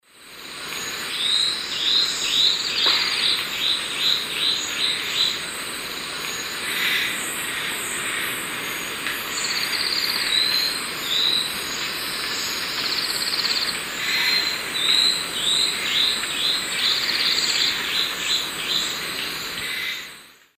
Myrmotherula hauxwelli, song, S. America Eastern Lowlands